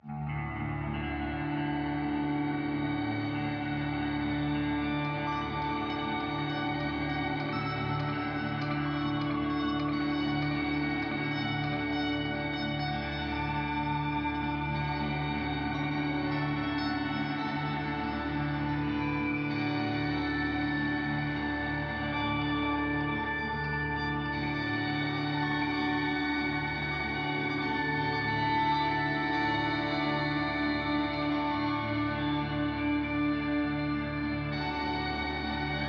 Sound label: music cinematic rejection; Rage in Peace